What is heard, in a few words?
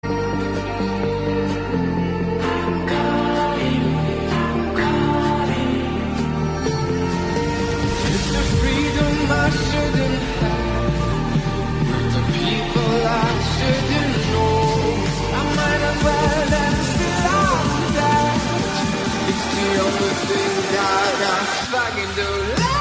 trance ringtone